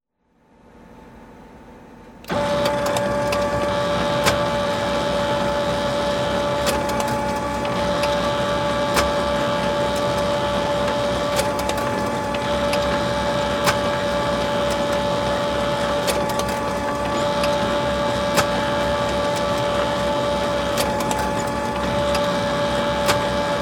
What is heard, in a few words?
Office fotocopier scanning and printing